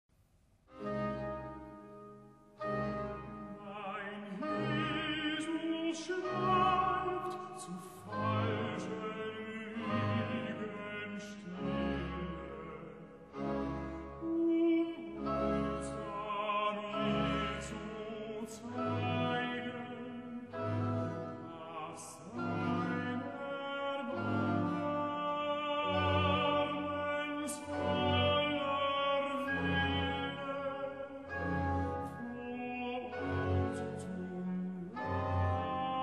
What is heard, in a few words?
recit, tenor hobo viola da gamba; bach; Mein Jesus schweigt